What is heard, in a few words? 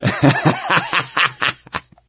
laughter, gambler, survivor voice